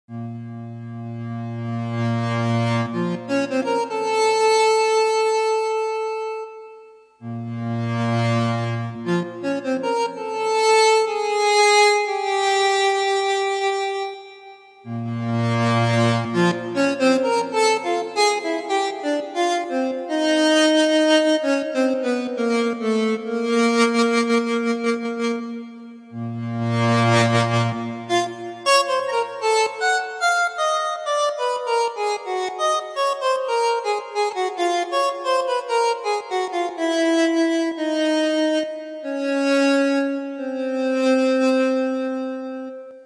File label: tango